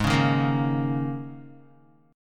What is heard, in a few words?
Ab Suspended 2nd Suspended 4th Ab down Guitar